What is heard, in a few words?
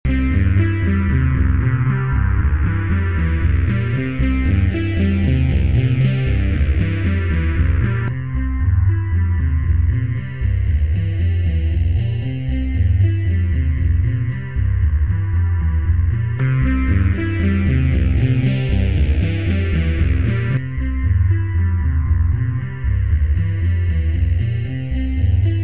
FFB stereo effect